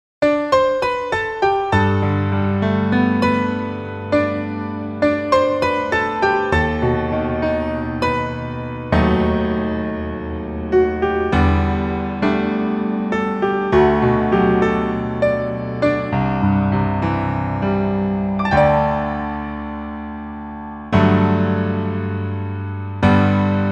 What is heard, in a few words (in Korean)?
Piano ver, 남자키